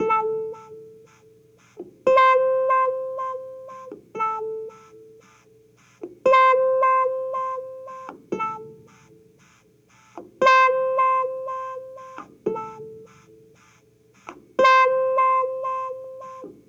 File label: Cruisin' Disco Clavinet, 70s Analog Funk; Sonic Foundry (Sony Creative Software); What it Is!